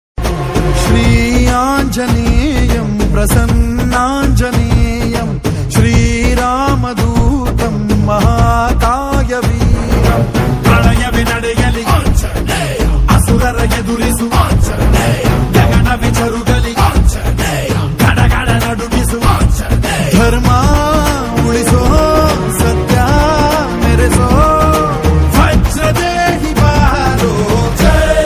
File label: Theme Song; Hanuman Movie